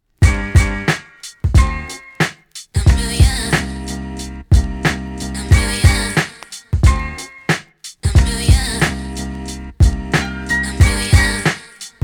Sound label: instrumental